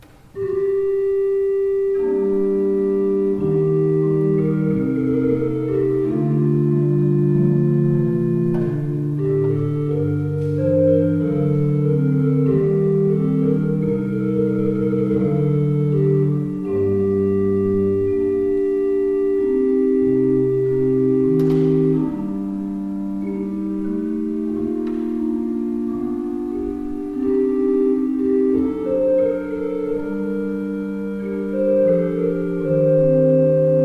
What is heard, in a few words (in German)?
gebraucht orgel; Graf